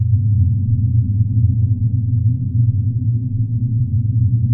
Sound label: RUMBLER; Distored Reality